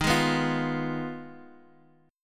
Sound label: F Minor 7th Flat 5th, D sharp